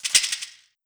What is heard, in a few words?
Deep Shake Perc; Percs